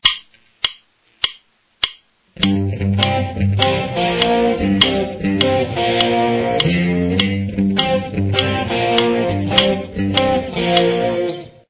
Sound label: guitar